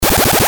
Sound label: roller